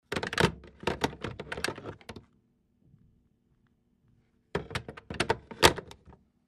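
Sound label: Telephone Fumbled Pick Up Hang Up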